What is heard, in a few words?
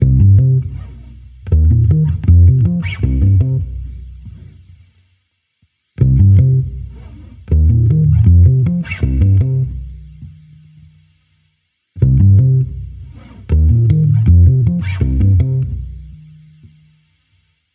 Amped loop 160 Cm, Electric Bass Loops; Slate Digital BASSHOLE Sample Pack